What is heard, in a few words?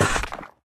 stonecutter cut; Minecraft